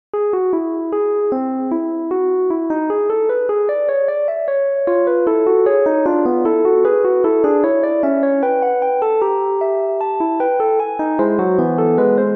fughetta major; umezawa sadayuki

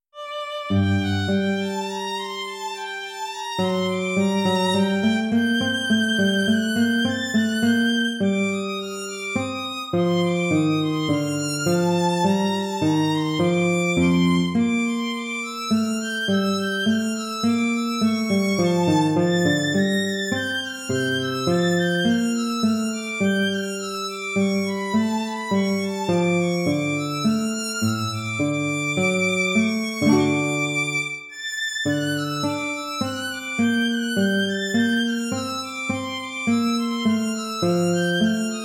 courante

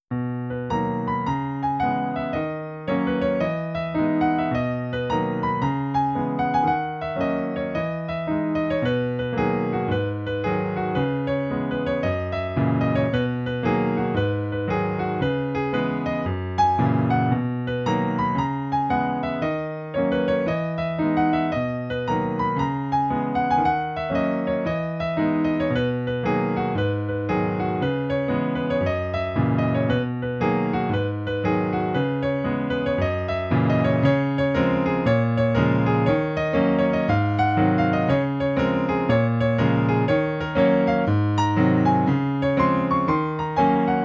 7th chords

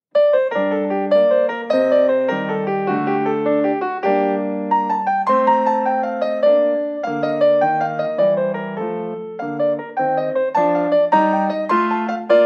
giga gigue; zipoli domenico

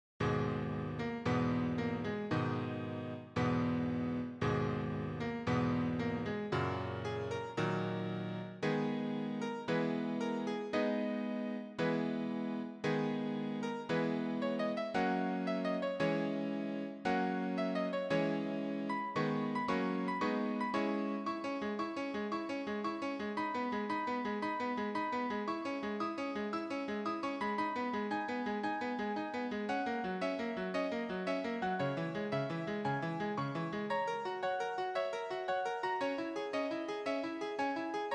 nocturne in la bemol mineur

